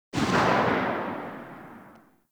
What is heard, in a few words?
Fire Tail; Weapons; Seer; Warframe